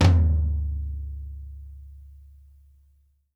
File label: FLA FLOOR, TOMS; SW TOMS